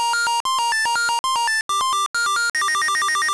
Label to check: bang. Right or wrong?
Wrong